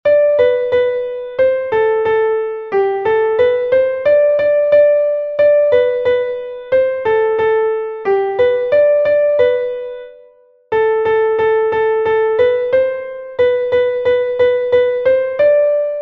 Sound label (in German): klavier; alles neu macht der mai; melodiemeister